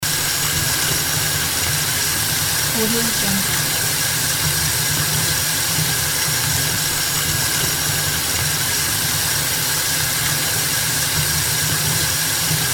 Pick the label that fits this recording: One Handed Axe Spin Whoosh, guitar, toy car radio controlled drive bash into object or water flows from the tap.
water flows from the tap